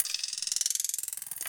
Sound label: LOOP PC, Drum & Bass, 160 BPM; Ueberschall; Drum & Bass Flash Trackz